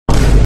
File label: vine boom cut off